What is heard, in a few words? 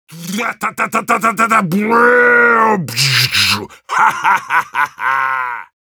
yell, ru